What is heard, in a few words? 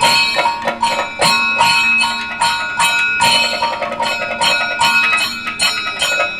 KATAK, Indian Traditions